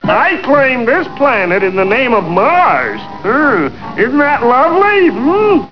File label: death; Alien Arena; marvinmartian